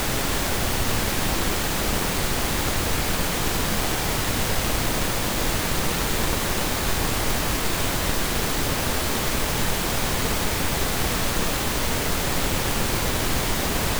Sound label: pink noise